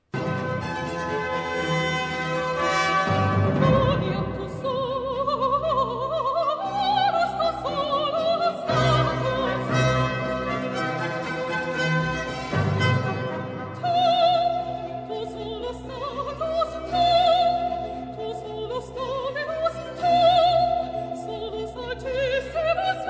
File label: Missa